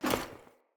Foley Action Armour Melee Swipe; Player; Deora Armour

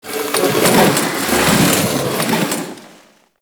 Intro Chairs; Zariman

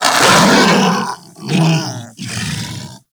Kubrow Armored Vocal Death; Warframe

